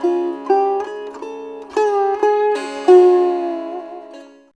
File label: SITAR; Spectrasonics; Heart of Asia